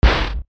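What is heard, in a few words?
percussion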